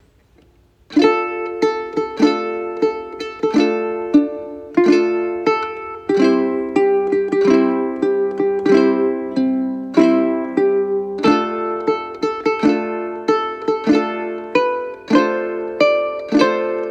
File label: Uke; Uke book greedy pirate